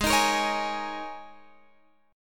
Ab Suspended 2nd Suspended 4th Ab down Guitar Open F; strummed; Absus2sus4